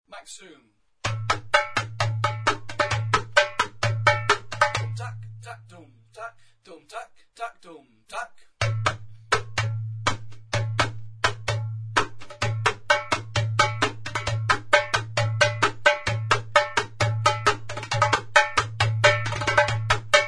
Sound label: maqsoum, arabic